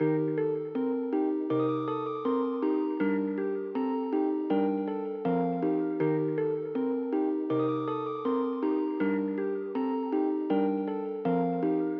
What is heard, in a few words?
Nostalgic Melody Loop 160 BPM G Min Bells; Loop Stems; Cymatics; 2020 Melody Collection